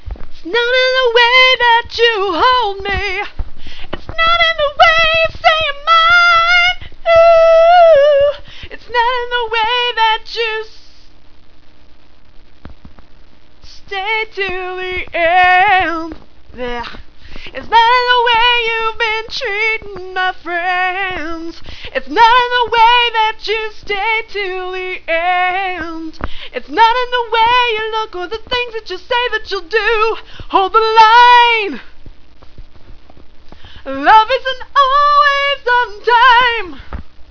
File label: karaoke